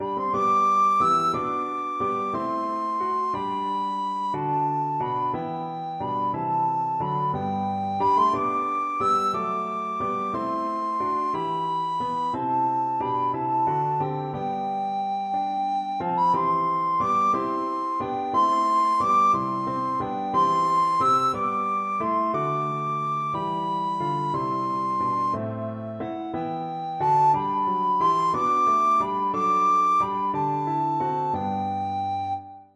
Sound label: Listen to my Story, recorder